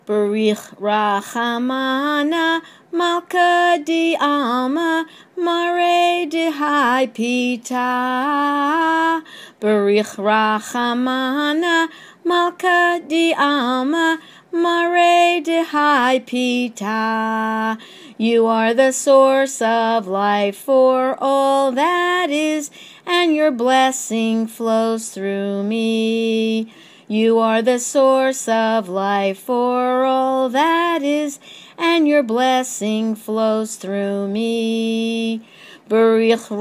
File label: blessing after meal